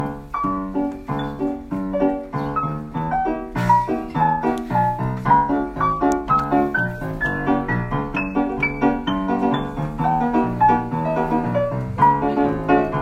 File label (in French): IMPROVISATIONS